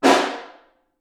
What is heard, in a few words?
Snare Far